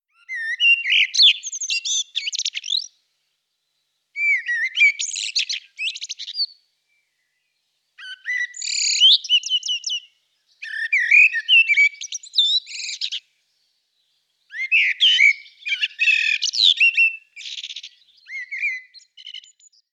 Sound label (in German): Amsel Turdus merula, Vogelstimmen